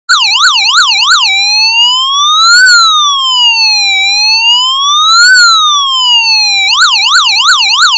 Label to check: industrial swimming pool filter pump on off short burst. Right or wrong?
Wrong